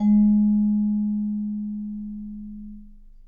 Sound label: G#3, Percussion & Harp; Miroslav Vitous